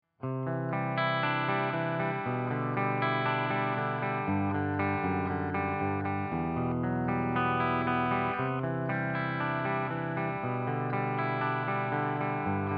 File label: Gibson LP clean bridge